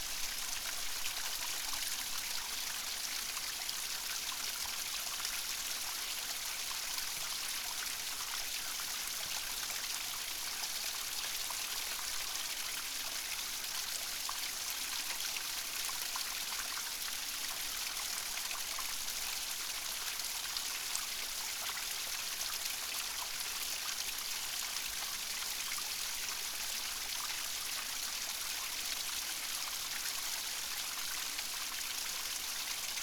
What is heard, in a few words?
fountain; oasis